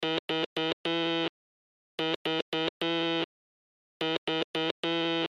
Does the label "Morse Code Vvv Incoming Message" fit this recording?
Yes